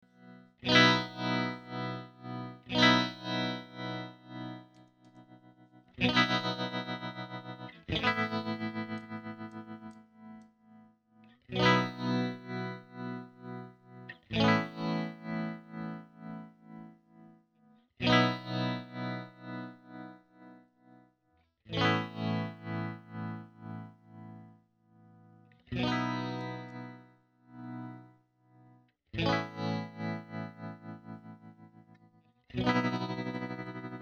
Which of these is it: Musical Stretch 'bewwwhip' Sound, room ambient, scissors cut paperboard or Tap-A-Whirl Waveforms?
Tap-A-Whirl Waveforms